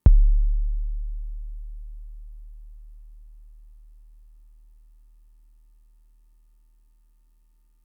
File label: kick, Fricke MFB-522; KICKS